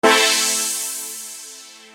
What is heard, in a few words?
Quick Pad; Construction Kit; HIP-HOP; Sonic Foundry (Sony Creative Software)